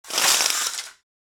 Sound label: Cordless blind pull down; blinds